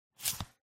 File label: card